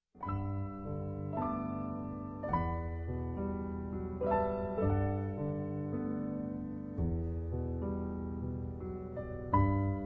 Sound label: Piano; Trilogy